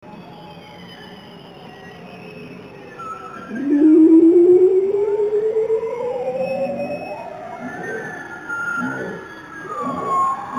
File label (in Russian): Grenlandskiy kit (Balaena mysticetus), Zvuki kitov